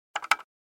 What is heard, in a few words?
Button